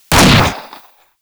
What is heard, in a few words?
Fire Shot 2D, Weapons; Warframe; Bronco